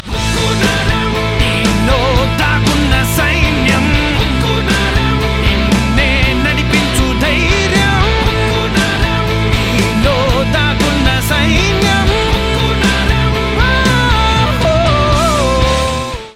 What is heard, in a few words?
Theme Telugu Ringtone